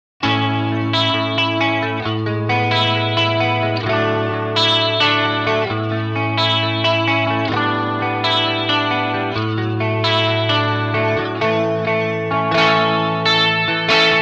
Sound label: MAXON CS550 deep chorus